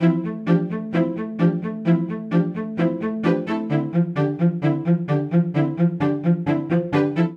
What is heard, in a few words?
Phrase Energetic I-vi-V-II i F Major 130 bpm, String Quartet; MIDI Clips; Ableton; Factory Packs; Spitfire Audio